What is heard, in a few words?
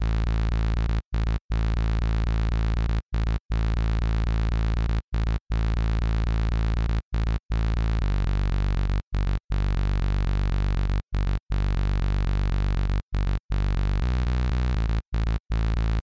Bass